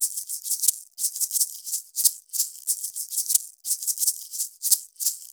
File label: ETHNO EXTRA 90; ethno2